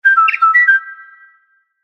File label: call mail; HTC; Infobar-A02